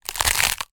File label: food potato chip crisp crush; zapsplat